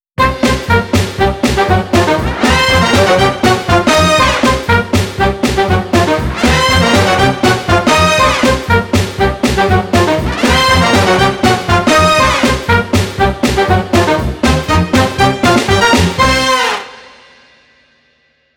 cheerful sax; by burak sound bank; cheerful vol 18

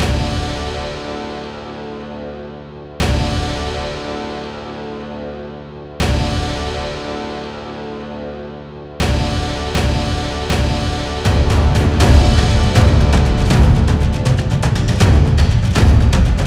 tension; By Burak Sound Bank; tension gerilim vol 20

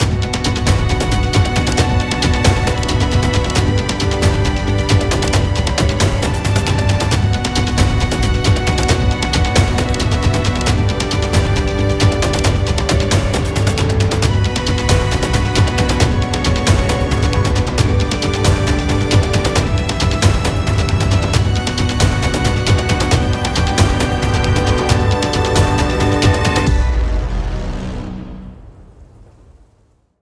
tension; by burak sound bank; tension gerilim vol 47

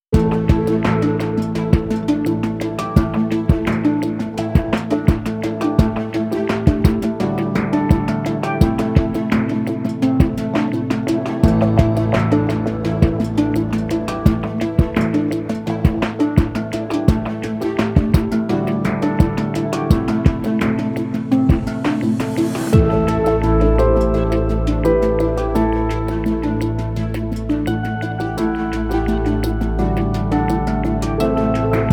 tension; By Burak Sound Bank; tension gerilim vol 21

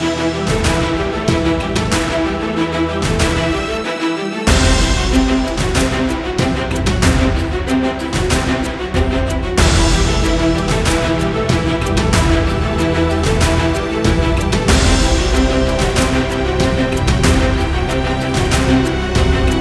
tension; by burak sound bank; tension gerilim vol 48

